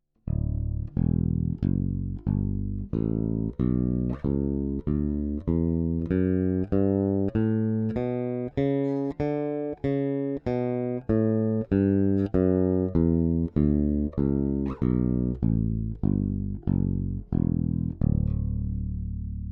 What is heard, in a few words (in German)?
Big Blade Bridge PU passiv; Nordstrand